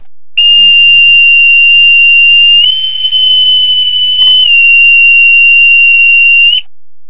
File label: sm boat alongside